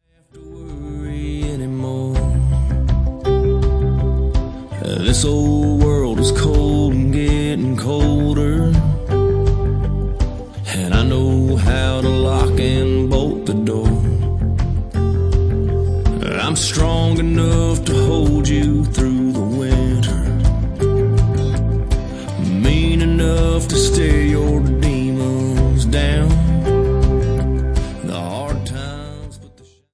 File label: Country